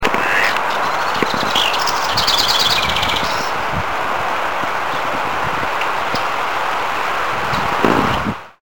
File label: song